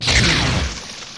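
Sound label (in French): impact système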